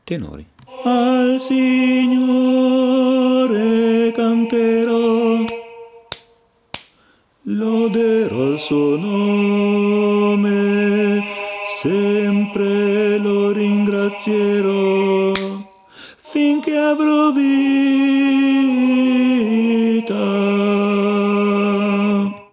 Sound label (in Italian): Tenori